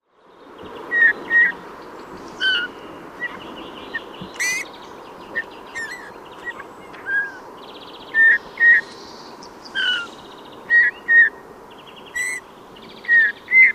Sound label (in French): bouvreuil pivoine, chant